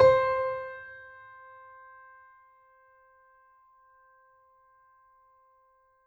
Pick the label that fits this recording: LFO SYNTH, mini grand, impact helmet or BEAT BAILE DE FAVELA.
mini grand